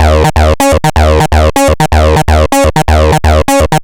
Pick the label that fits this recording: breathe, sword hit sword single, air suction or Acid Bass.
Acid Bass